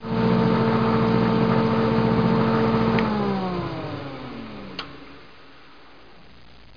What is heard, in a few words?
car off; Broderbund Print Shop Multimedia Organizer Deluxe Edition